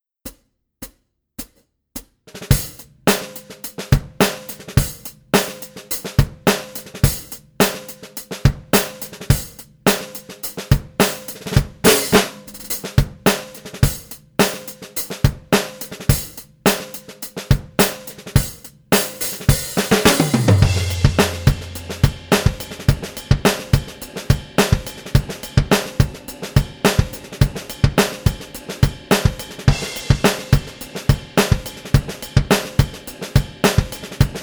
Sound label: drum